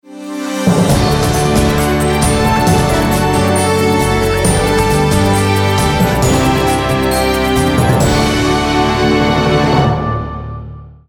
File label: Well Done (jingle); CitiesXL unofficial soundtrack; windows gamerip 2009